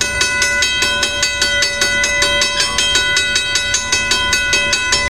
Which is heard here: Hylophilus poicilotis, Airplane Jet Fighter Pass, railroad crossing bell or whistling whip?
railroad crossing bell